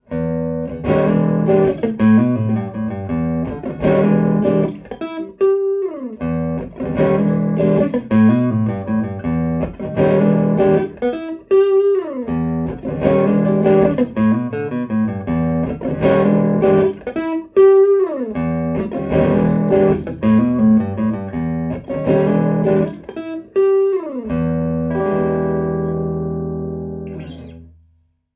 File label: Multimic Room Elec Gtr C3000 Room